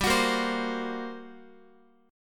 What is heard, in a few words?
G Minor Major 11th, G, Guitar; GmM11 strummed; JGuitar